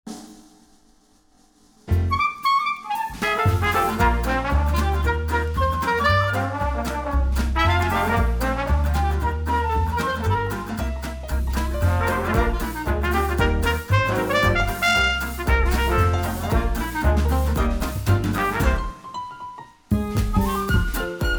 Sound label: waggish piano